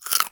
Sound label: crisp chew; farming; dfcaverns